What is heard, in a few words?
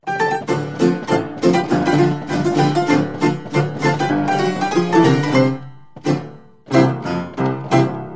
play piano